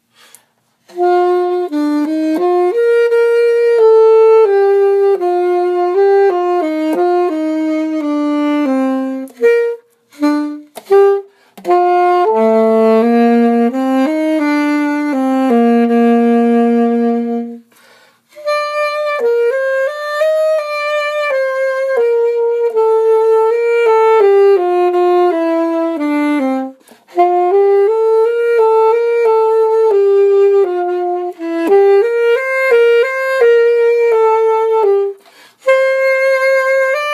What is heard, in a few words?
alto sax